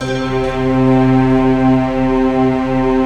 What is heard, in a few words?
PAD, 140 BPM; Ueberschall; Drum & Bass Resonance